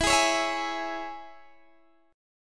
E 6th, Guitar, DADGAD

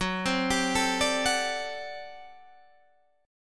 G Major 7th Suspended 2nd Suspended 4th F sharp

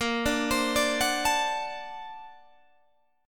A sharp Augmented Major 9th Guitar Open G

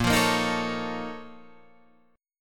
Bb Minor Major 11th Bb, Guitar, Drop D

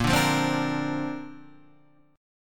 A sharp Major Add 9th, Guitar Standard; strummed

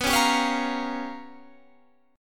G 7th Sharp 9th Flat 5th

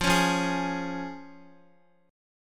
F Minor Sharp 5th, Guitar; strummed; chordshape; x,x,5,6,5,4

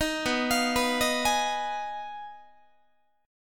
B 6th Flat 5th, down, Guitar; B6b5; arpeggiated

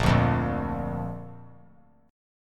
A sharp Major; strummed; JGuitar

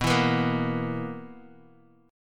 B Major 7th Suspended 4th, Guitar DADGAD chord

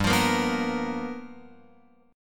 G 7th Sharp 9th G chord, Guitar Open F; G7#9 strummed; JGuitar

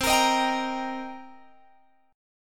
C Minor 7th, Guitar, Open G

